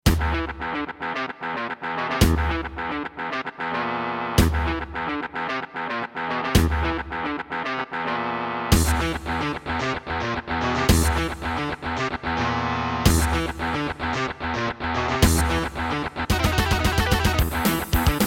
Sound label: Roland RAP-10; Doom